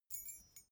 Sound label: key ring small fly tinkle